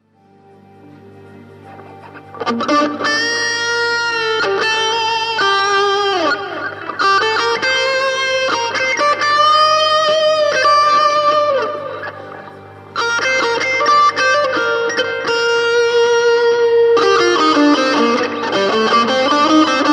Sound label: Guit